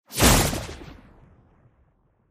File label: Swish Fused Large